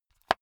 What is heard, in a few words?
watch case small close hard